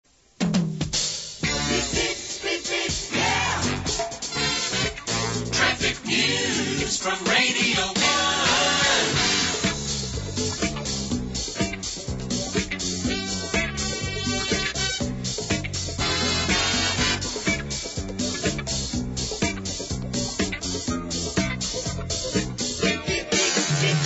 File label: traffic news jingle